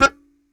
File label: Very Short Note, Melodica